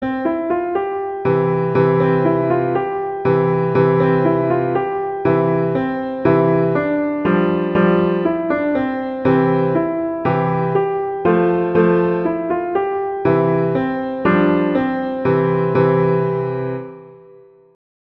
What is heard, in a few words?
When the Saints Go Marching In, piano; Beginning Piano